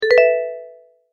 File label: multimedia tone start; Sound Design